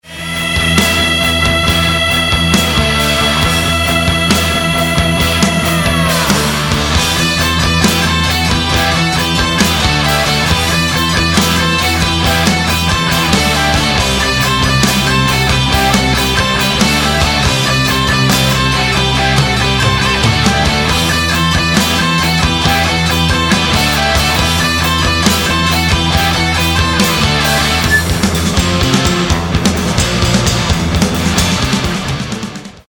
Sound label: guitars